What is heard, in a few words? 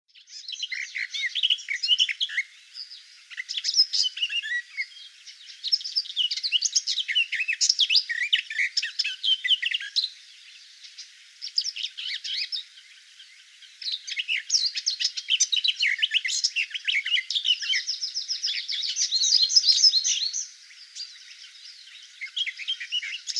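sadovaya slavka golos, garden warbler voice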